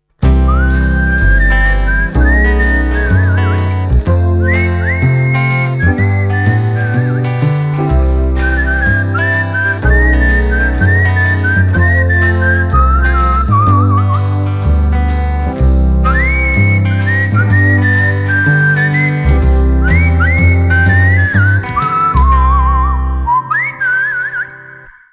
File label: Jazz